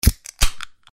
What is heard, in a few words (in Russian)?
otrkryli gazirovku